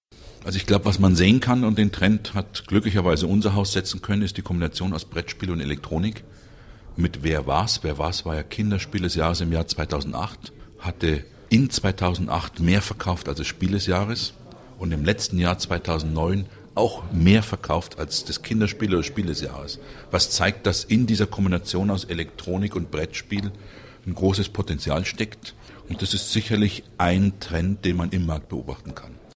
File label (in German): elektronik